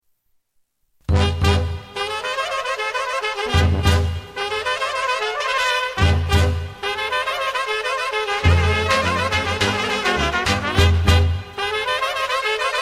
Cocek - Macedoine

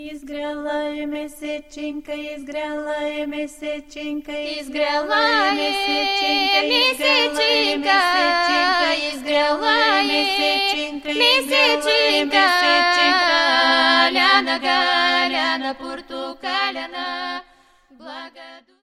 Racenica - Bulgarie

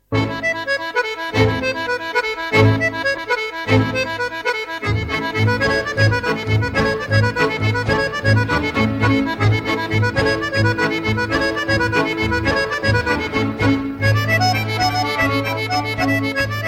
Horo - Bulgarie; Zizaj Nane (Daicovo Horo)

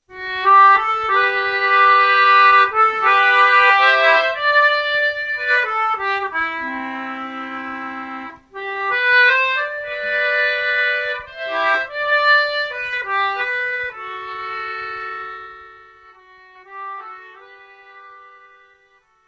instruments concertina

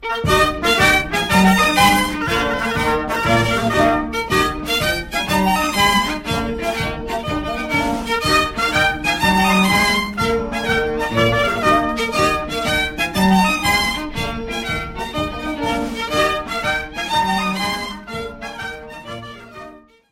Gaillarde - Belgique

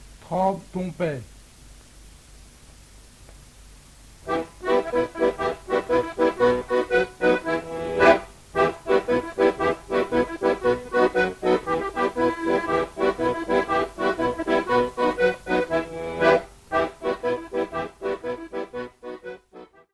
Tremp ton Pain, Belgique

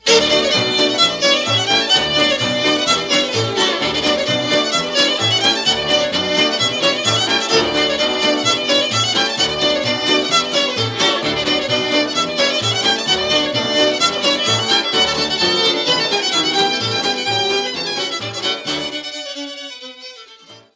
Reel, Canada

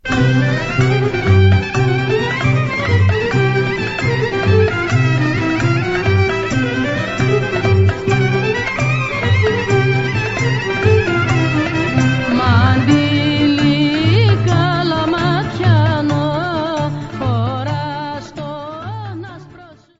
Kalamatianos, Grece

